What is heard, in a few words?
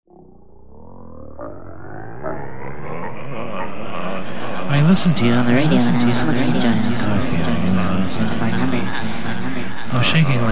Weirder Music